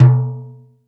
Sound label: OPN HND, Timbale; Timbale Battery